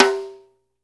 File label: HIT, SNARES; Sampleheads; New York City Drumworks VOL-1